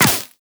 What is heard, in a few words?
Player Laser Impact Flesh; Warframe